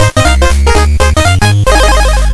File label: Victory jingle; curse crackers for whom the belle toils switch windows gamerip 2022